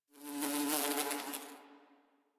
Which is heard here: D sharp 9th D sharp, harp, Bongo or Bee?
Bee